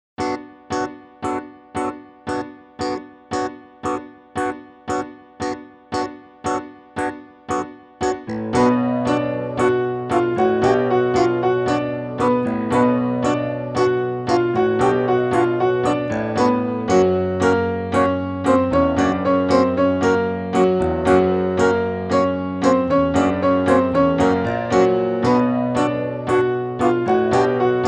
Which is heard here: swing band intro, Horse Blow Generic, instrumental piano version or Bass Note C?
instrumental piano version